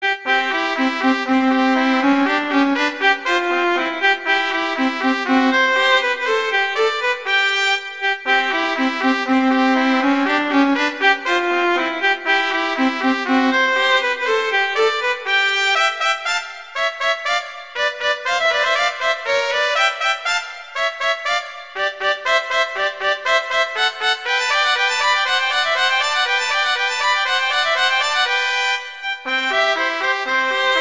Duet; Mozart; Duets

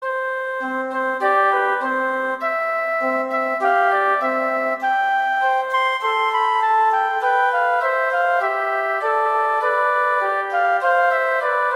Duet; Mozart; Duets

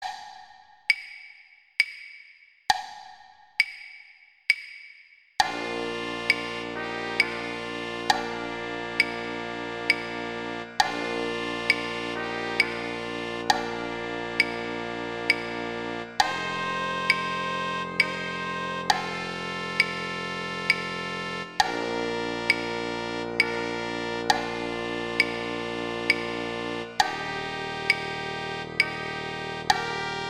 Trombone; Gruber; Silent Night

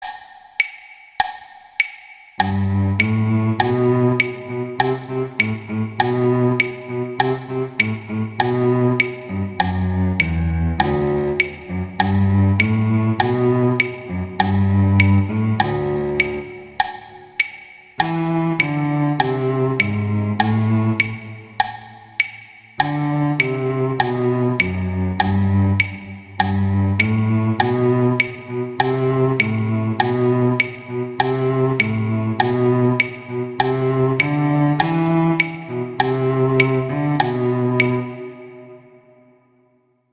Duet Cello I; Duets; Mozart

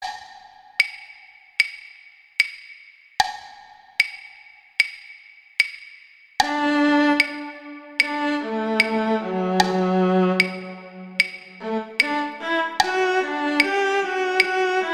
Cello; Cello I; Mozart; Duets

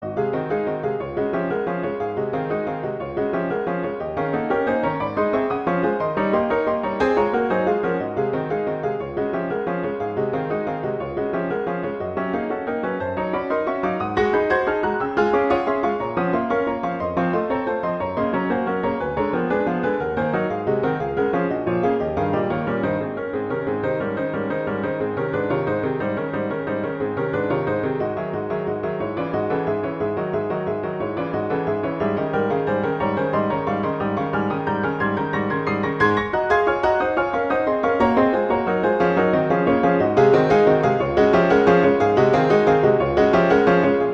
Etude Vivace legato; Chopin; Etudes

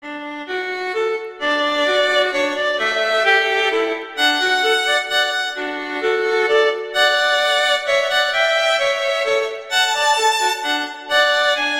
Minuetto, Duet; Mazas; Duets; Duet 2

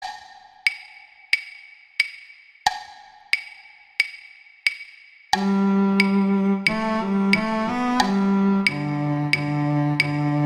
Cello II; Mozart; Duets

